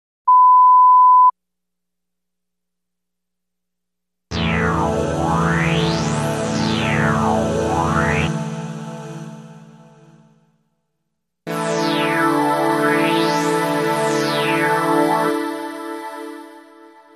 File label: Synth Pads